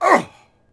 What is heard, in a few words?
hit vox; Civ4 warlords